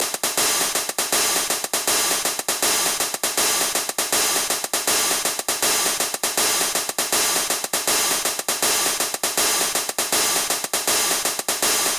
Dj Hi Hat Sample

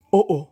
Uh Oh

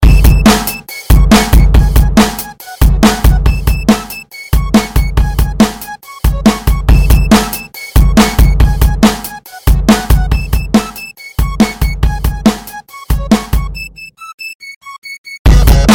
Guitar Scratching

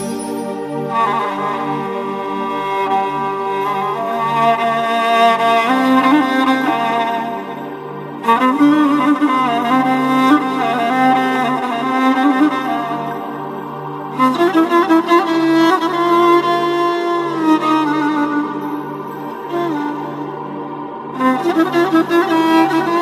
violin sound

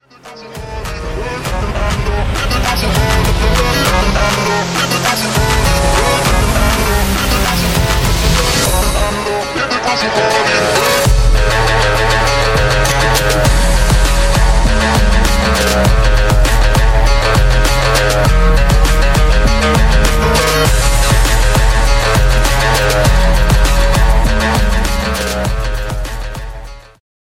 Cool Ringtone

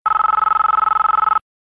Business Tone

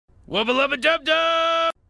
SMS; Rick and Morty

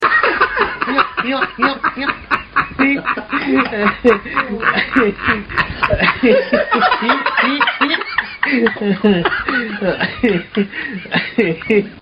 Hahaha

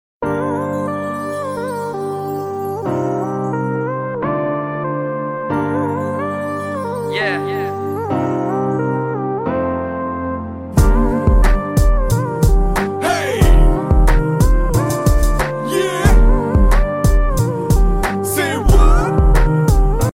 Beat

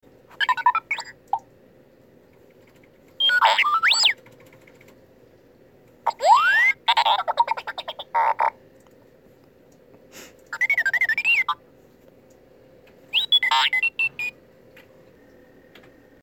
R2D2

